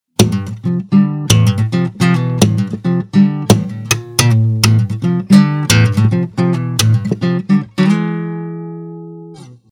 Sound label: acoustic slap rock